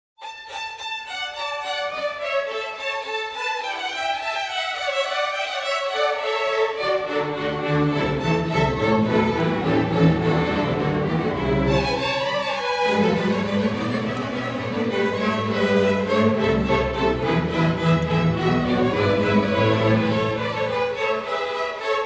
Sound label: Concerto In D Major For Four Violins IV Allegro